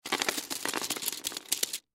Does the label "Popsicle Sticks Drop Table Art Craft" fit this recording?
Yes